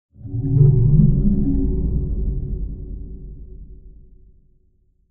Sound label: whoomp, synth; video game; ambient; source engine; portal2